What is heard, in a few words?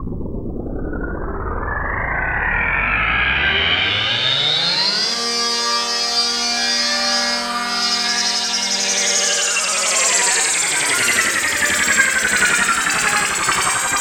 alarm; Masterbits - Tekkno Trax; MACHINES+FX